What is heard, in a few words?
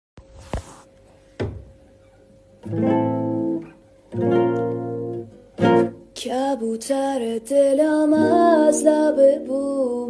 Guitar Dokhtar